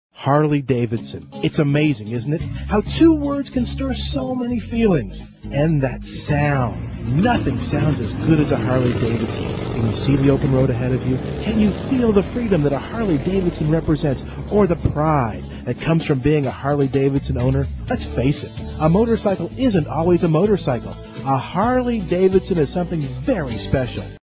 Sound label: harley